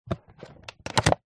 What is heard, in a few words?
food drink empty plastic milk bottle handle short fast